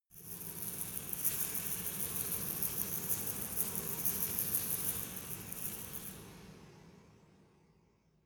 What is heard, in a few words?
resonator rattle soft